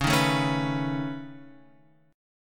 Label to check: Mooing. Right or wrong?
Wrong